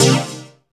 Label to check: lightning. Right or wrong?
Wrong